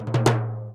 drumbreak, 130; Ueberschall; Techno Trance Essentials

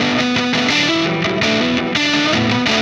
Static FX Guitar

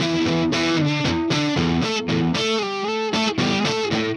Melody Riff Guitar, Indie Rock; Sonic Foundry (Sony Creative Software); Indie Rock Anthems; Session 06 - Haze

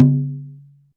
HANDDRUM

